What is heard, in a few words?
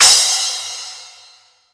crash sound e key, Royality free crash cymbal sound tuned to the E note. Loudest frequency: 6061; drum sample; one shot